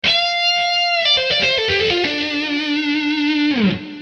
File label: Dm 120 lead